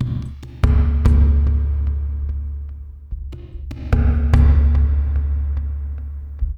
BOL WD WET, BOLERINO, Liquid Grooves; Spectrasonics; Liquid Grooves 1 & 2

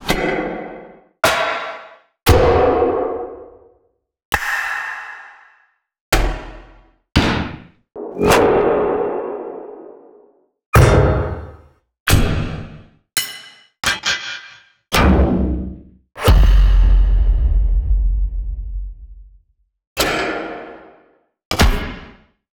Industrial Hits; Antidote

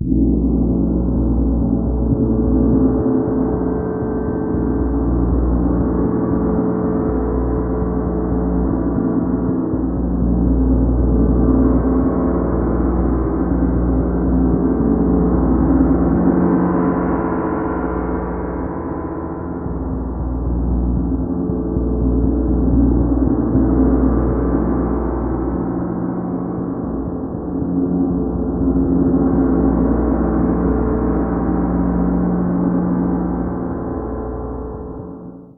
Gong noise; 90 s Sample CDs; PowerFX - Downtown Orchestra

